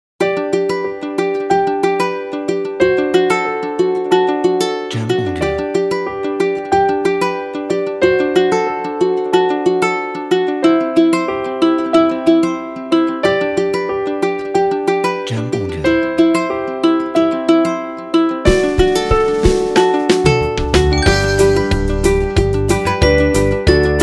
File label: Upbeat Corporate